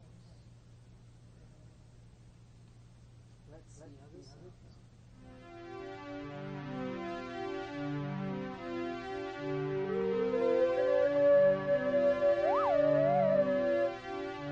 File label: Strings Recorder